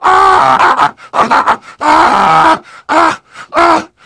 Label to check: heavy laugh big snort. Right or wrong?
Right